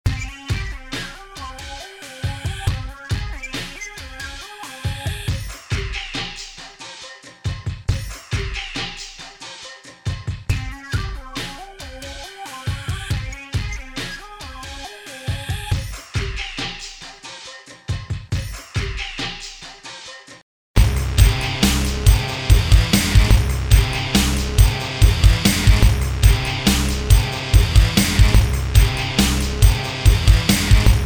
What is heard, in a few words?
hardrock beat with intro